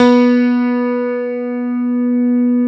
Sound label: CP-80, Yamaha CP-80; Greytsounds VOL-1